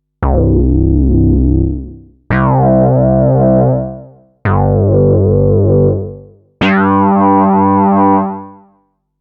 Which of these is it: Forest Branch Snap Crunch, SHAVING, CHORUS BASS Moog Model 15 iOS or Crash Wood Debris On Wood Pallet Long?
CHORUS BASS Moog Model 15 iOS